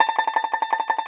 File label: T. BANJO, Tenor Banjo; E-MU Producer Series Vol. 7 – Old World Instruments